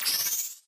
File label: Holo Appear; DataKnife; Titanfall 2